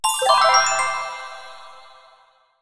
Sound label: surprise